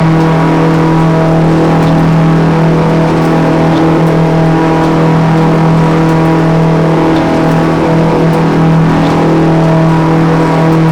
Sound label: i4 petrol, engines; i4 petrol20 1; ACF CustomMod